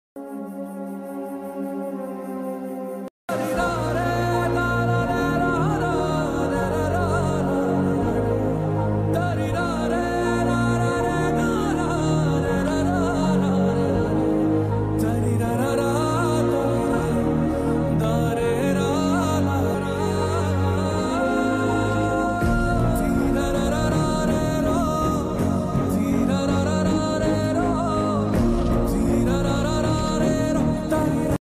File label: emotional bgm; guntur karam; mahesh babu; ramya krishhna